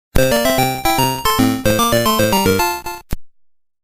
Game Over

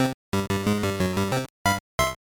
Golf Clear (Over Par); WL3

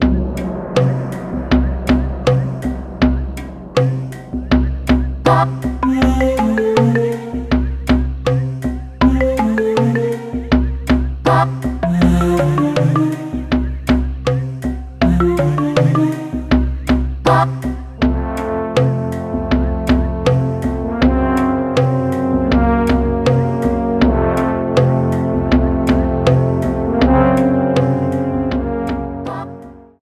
Lava Underground; NSMBW

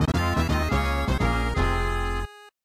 Bonus Lose, Jingle playing when failing a Bonus; DKC3 OST

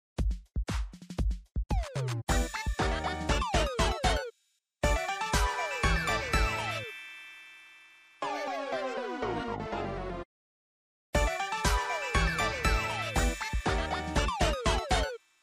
Jingles; Penny; WarioWare Gold

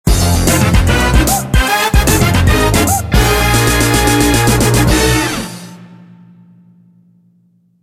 Birdo team jingle

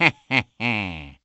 Evil Laugh; Mr. L

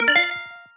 Stylish Move

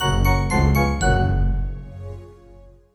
Goal; SMM; Luigi

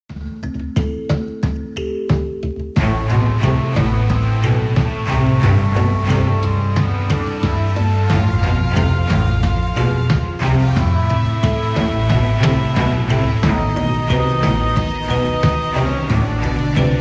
Haunted Towers Music

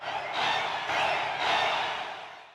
Roshambo Win Cheer

